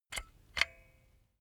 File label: silicone gun bullet lock mechanism regular; berufe; handwerker; soundpool